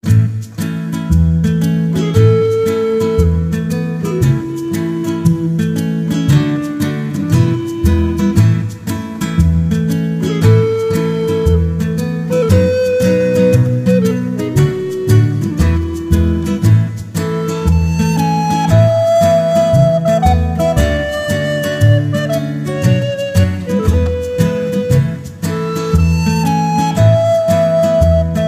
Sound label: Relaxing; Love Clear; PS4